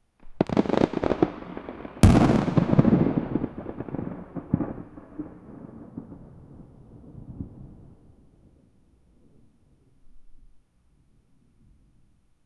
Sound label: lightning strike sharp